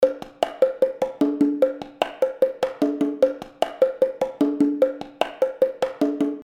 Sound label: Perc Real Percussion